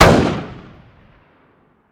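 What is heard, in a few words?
weapon ar fire player atmo exterior; gmod; viper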